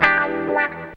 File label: GTR WAHWAH, Funky GtrLicks; E-MU Formula 4000 Series Vol. 1; Hip Hop Nation